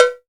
COWBELL

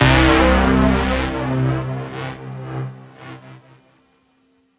synth note C; Virtual Riot

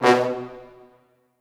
B1 L, Orchestral Brass

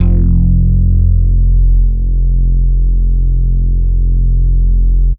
Synth Bass; Bass X

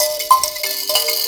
VIB KALIMP, VIB KALIM

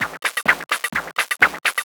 FX Loop 128 BPM, House

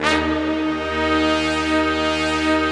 big brass, Slow Big Brass; Optical Media International - Sonic Images Library

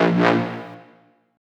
D&B Bass Hit; neuro

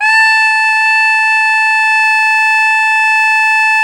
MIZMAR A4

